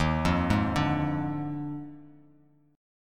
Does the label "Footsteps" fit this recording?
No